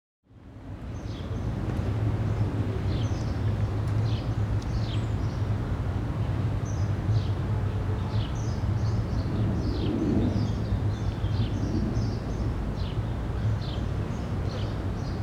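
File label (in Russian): городской шум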